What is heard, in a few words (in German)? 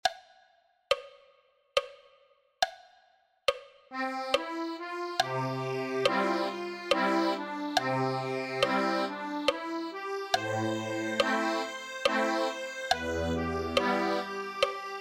akkordeon; Barbara Allen; folk gospel songs